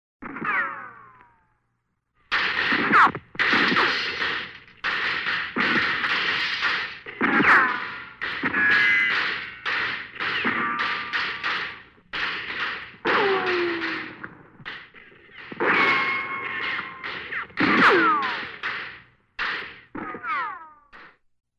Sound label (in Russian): tehasskaja perestrelka